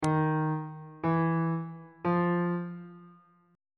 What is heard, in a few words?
b double flat 5th interval pitches on bass clef